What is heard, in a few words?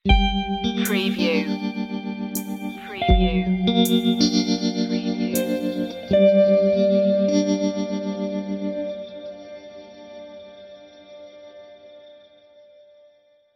spooky guitar pick